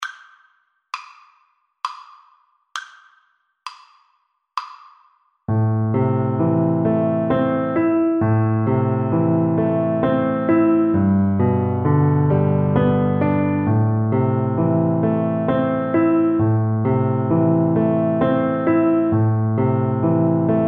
scarborough fair, Double Bass

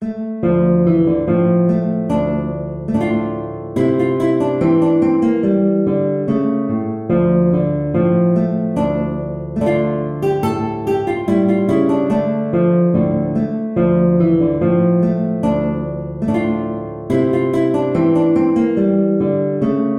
guitar

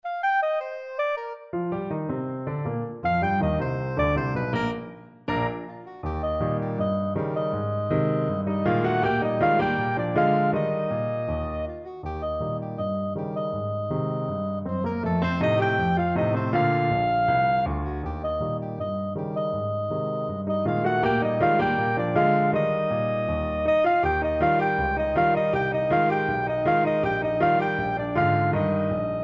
soprano saxophone

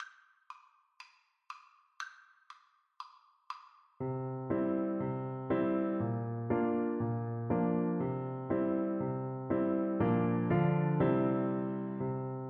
french horn

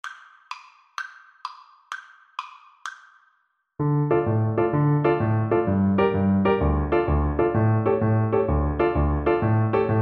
tarantella, bassoon

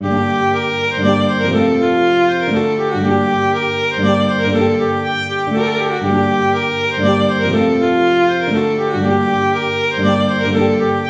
violin guitar duet; Old Age and Youth

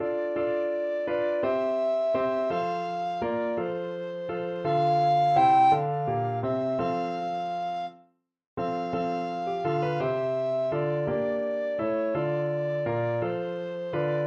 puer natus, recorder

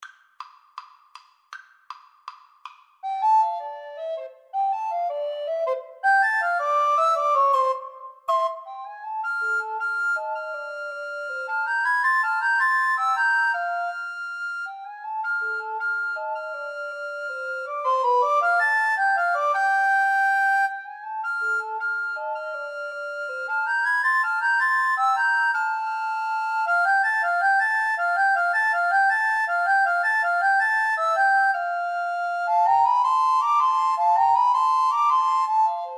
the entertainer, Recorder Trio

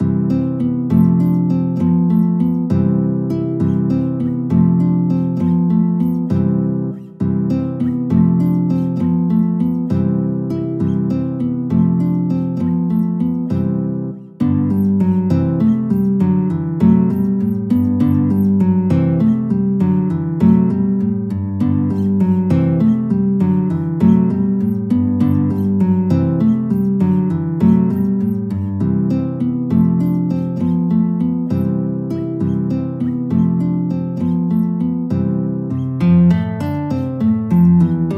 guitar; reapers

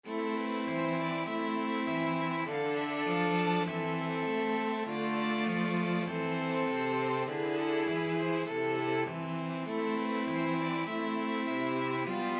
national anthem, string quartet

